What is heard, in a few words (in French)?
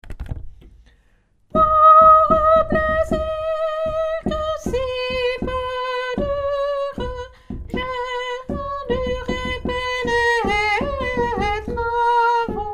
tenor; pour ung plaisir